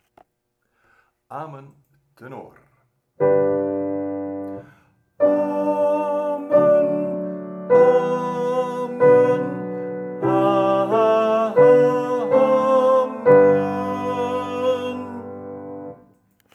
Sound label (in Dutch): Amen tenor, vierstemmig